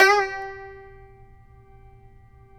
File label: TAR; Roland - LA Composer vol. 3